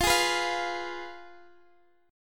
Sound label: G sharp Minor 6th, guitar